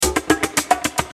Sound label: Beat